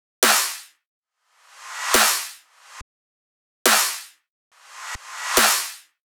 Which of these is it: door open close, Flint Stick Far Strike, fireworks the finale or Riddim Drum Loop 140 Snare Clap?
Riddim Drum Loop 140 Snare Clap